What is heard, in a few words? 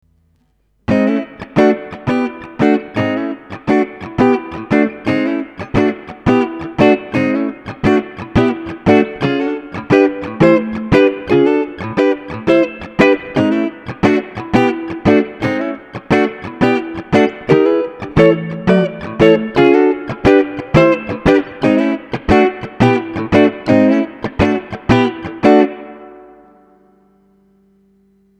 triad etude, guitar